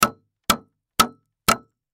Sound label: Hammer Nail Hit Wood; Hammer Nail Hit Wood Multiple; Hammers; Blastwave